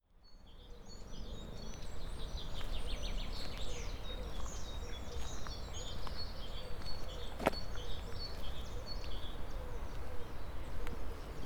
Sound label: ambience